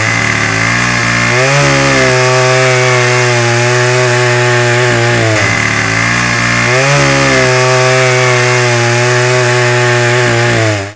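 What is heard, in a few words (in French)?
tronçonneuse; cs assaultjungle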